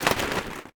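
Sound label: plastic object crash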